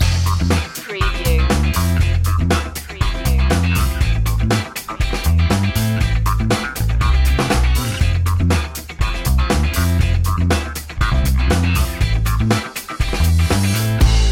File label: funky time